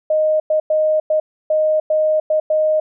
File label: morse cq 12wpm